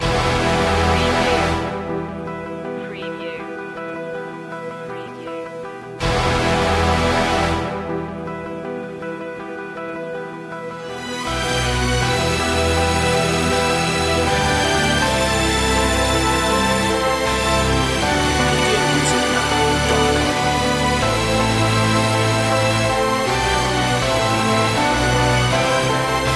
no choir no perc

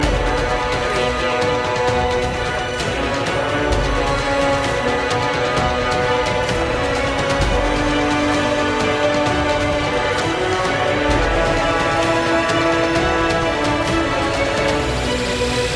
sounds of triumph loop end; media music now

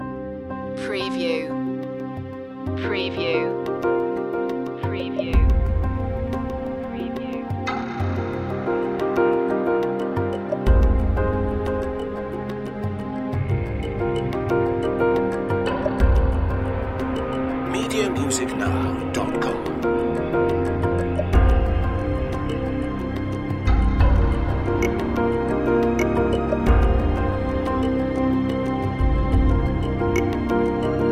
underworld series dramatic cue

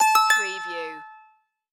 Multimedia Chime

